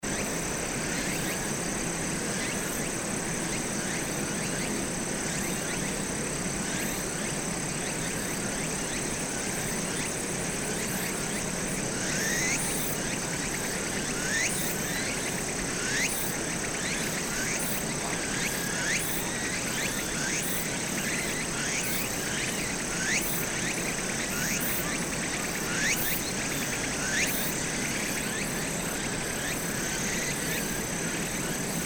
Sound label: cicada